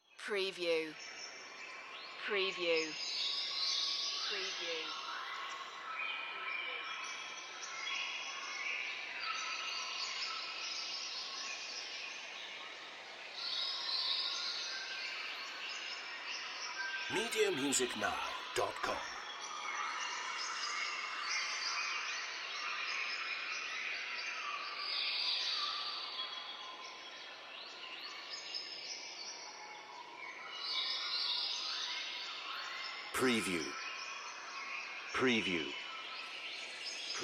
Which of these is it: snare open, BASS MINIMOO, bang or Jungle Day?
Jungle Day